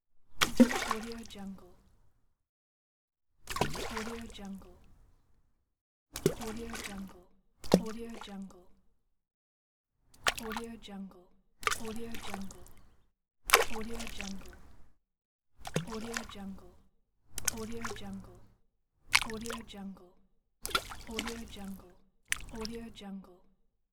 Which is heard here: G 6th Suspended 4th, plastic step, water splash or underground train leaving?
water splash